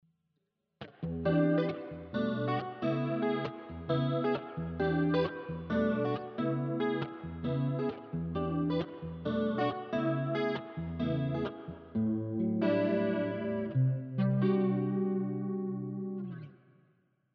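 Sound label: Dual Cube JC Clean with Chorus Reverb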